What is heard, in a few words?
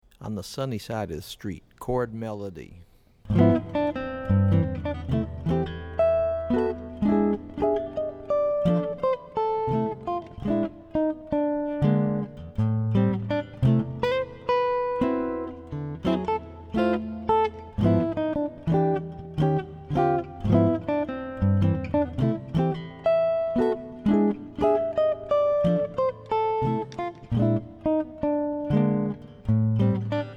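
Chord Melody, jazz tunes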